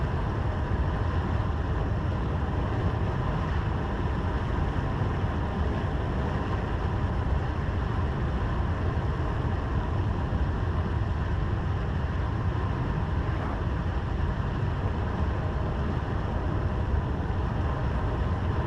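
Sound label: ambience tires asphalt loop; Blind Drive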